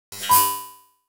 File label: robot buzz